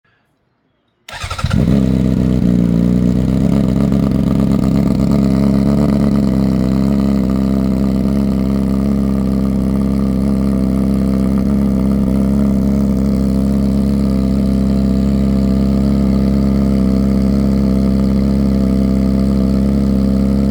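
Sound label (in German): Standgas, HONDA CBR600RR Auspuffsystem; Idle OVAL Q1-SN; 2024-2026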